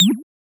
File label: Retro FX; Cymatics; Video Game Sample Pack Vol 1